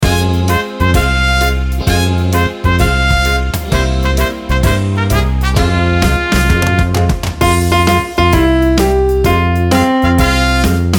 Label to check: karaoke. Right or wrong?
Right